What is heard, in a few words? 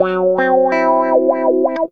GUITAR LICK; Ueberschall; Jam Box